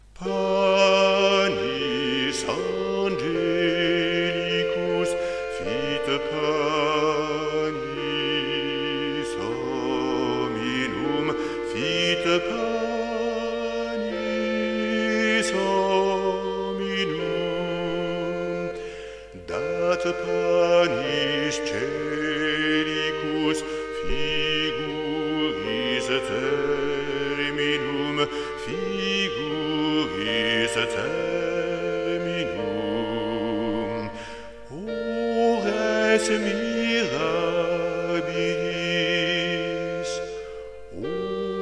Panis angelicus; Casciolini

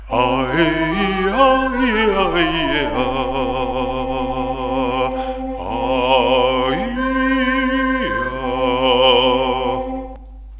Vocalise

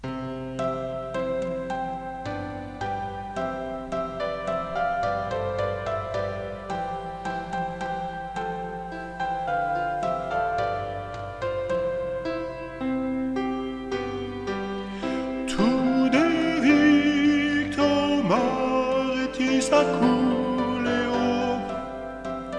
Te Deum; Charpentier

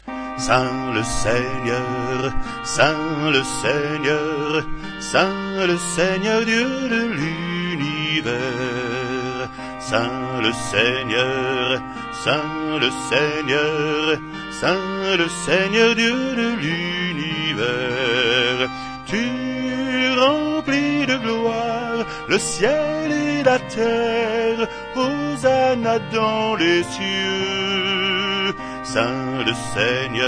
Sanctus Gloria laus Solo

